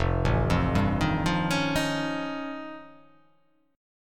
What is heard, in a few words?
F Augmented 9th F, chord